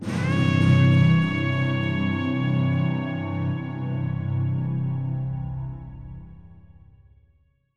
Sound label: hunter fanfare collaborative; Stellaris; grand archive